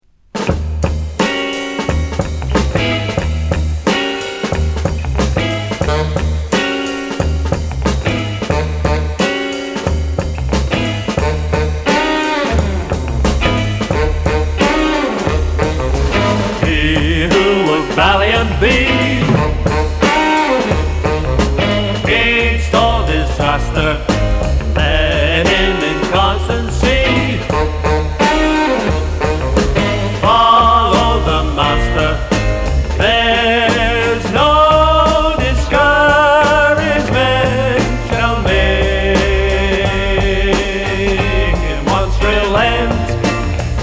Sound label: hymns a swinging